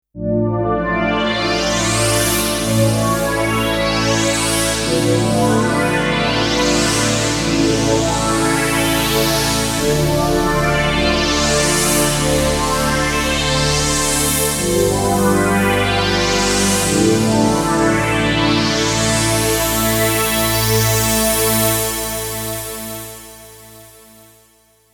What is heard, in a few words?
Super Sweep; Lightwave; dinopark